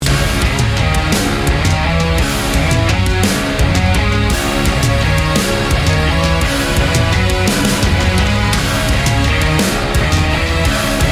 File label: Darkness Falls Instrumental